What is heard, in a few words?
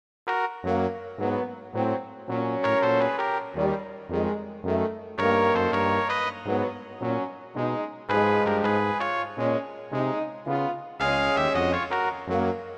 brass quintett